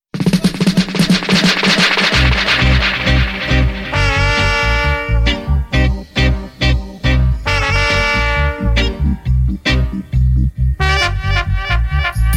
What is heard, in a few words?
Wreaking Horns Dub